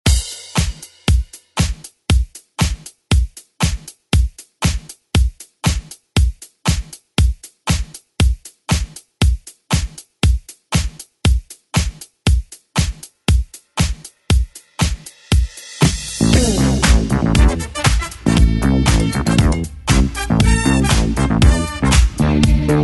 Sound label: 80s ReDrum; DJ Mhark